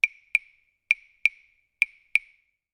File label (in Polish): Klawesy małe; Instrument wykonany z selekcjonowanego drewna bukowego. Dwie sztuki w komplecie; perkusyjne; Stuk i Puk - Dziecięce Instrumenty Muzyczne